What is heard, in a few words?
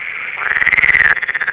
esculenta short, rana pelophylax; animals chordata salientia ranidae; eukaryotes